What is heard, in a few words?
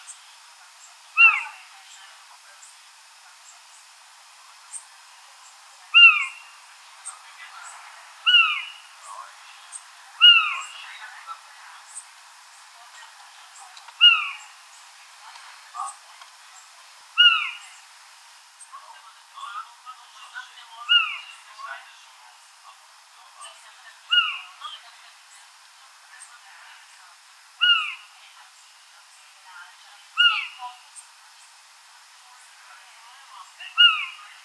call, otus scops; very similar to some Little Owl calls; Background: human voices.; can be easily confused with those vocalisations